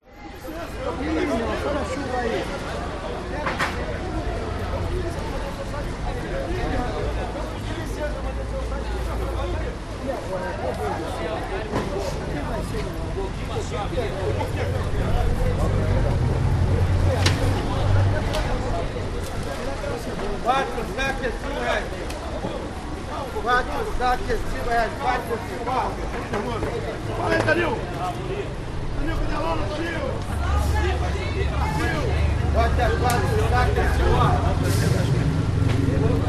Brazil Rio De Janeiro Farmers Market voices traffic in bg